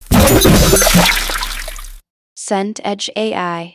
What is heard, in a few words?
splash large